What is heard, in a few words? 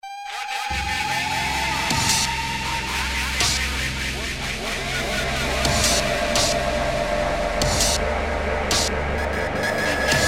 noiserag